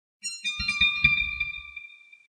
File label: Halloween